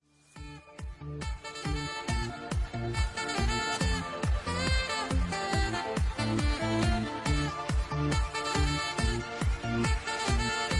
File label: sax